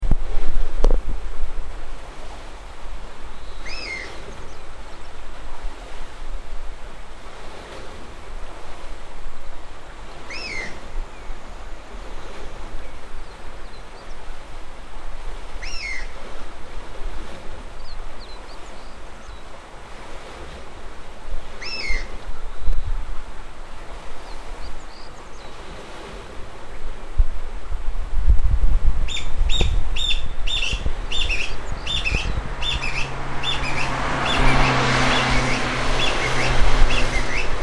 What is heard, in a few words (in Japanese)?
水路のそばの林から聞こえているコジュッケイの声です; 朝の散歩にはいつもコジュッケイが声を聞かせてくれています。